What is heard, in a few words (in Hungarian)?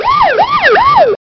szirena